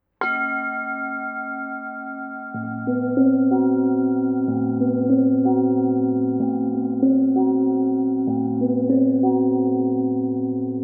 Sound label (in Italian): Percussioni